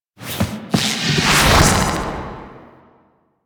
Transference Bullet Jump; Cinematics; Operator; Warframe; The New War; Throne Room

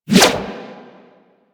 Prime Ankyros Whoosh A; Tenno

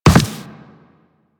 Land Soft Default, Footsteps; Warframe; Operator

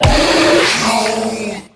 Pain

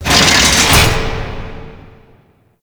Stadium Gate Open; Warframe; Quests; NineteenNinetyNine

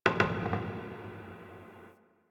Wooden Creak Small

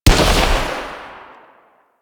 Arch Rocket Crossbow 2D Shot, Weapons; Warframe; Archwing

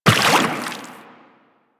Step Water, Footsteps; Warframe; Teshin

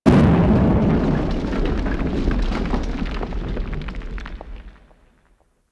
Asteroid Mining Explosions; Ambience; Warframe; Grineer Asteroid

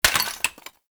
Vase Clay Tiny

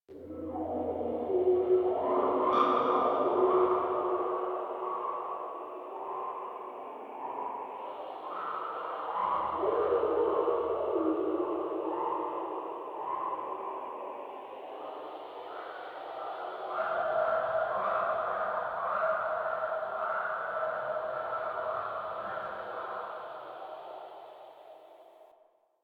Exterior Critter Loop; Warframe; Infested Eris; Ambience

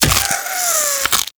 Embed Burrow; Warframe; Weapons; Infested; Inf Uzi

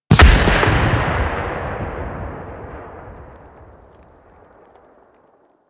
Tower Mining Burst C; Warframe; Ambience; Eidolon Plains; Ostron Village

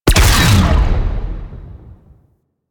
Mine Explosion; Enemies; Sentient Dolicholyst

